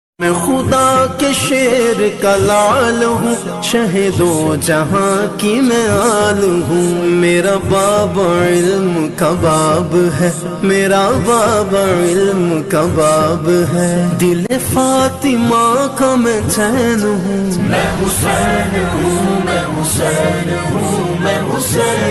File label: islamic ringtone